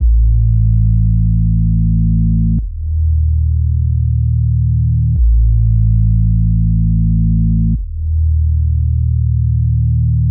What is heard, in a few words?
subbass two toner